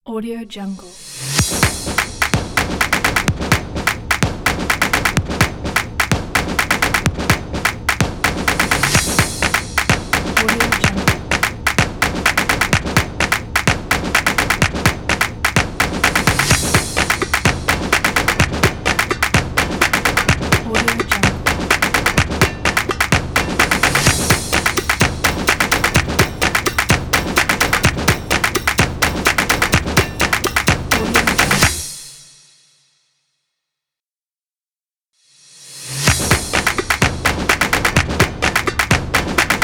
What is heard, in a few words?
arabic percusion logo